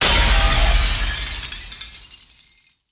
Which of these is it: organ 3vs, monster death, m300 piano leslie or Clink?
Clink